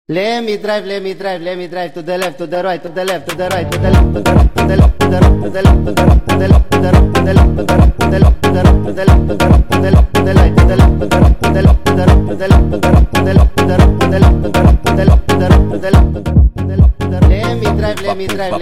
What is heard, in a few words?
Funk sped Up, funk